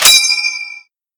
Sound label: ping, garand